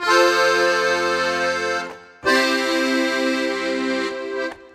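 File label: Accordion 108bpm C end, African; Quazibeat - African Secret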